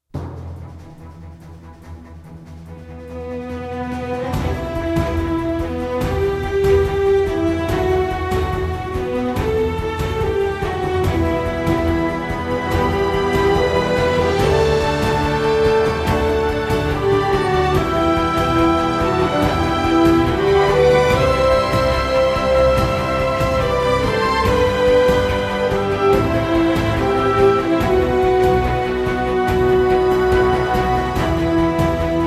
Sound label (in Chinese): 宣传片背景音乐